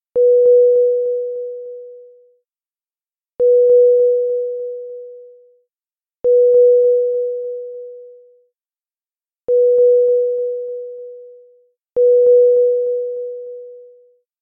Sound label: Airplane beep sound